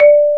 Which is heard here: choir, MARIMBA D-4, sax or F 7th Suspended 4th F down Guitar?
MARIMBA D-4